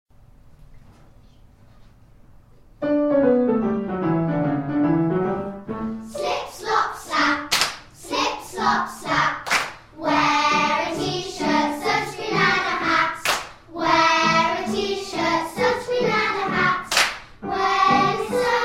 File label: song